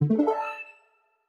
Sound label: start; NVDA